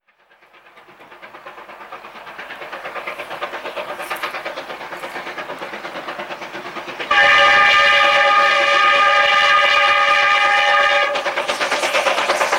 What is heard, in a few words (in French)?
locomotives a vapeur; sonotheque